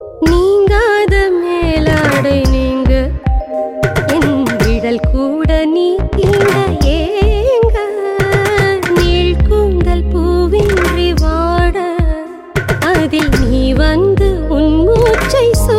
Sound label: tamil tone